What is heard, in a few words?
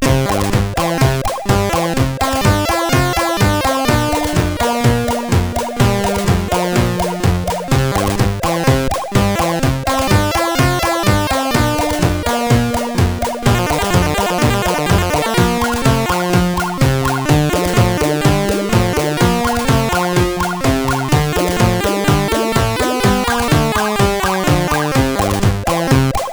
sid CSG8580R5; Fiendish Freddys Big Top O Fun